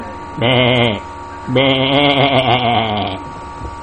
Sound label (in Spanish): oveja beee; animals